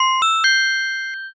tete bell

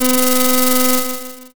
slot spin

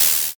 paper tear

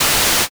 mosaic

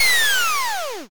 downer

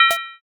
equip

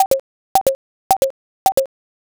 fs geta

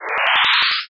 fusuma

